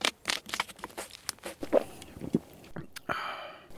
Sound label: bottle use; Escape from Tarkov